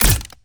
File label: atk rope launcher